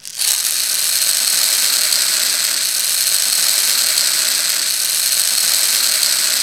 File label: rainmaker; Zero-G Ambient 1; RAINMAKERS